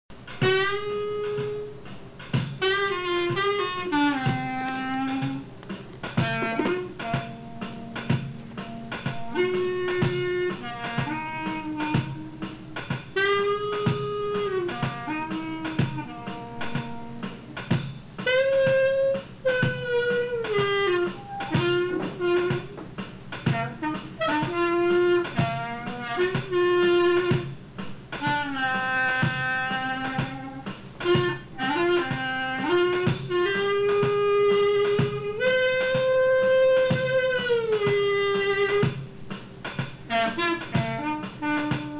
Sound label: single brass